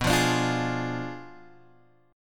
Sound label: B Diminished 7th B down Guitar Open F; Bdim7 strummed